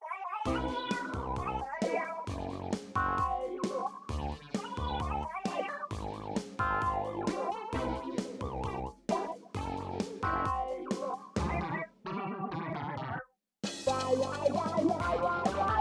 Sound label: BGM Plaza Shops Restaurant